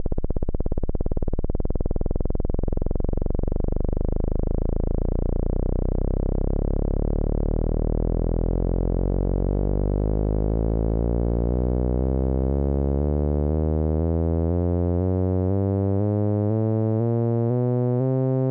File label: saw filt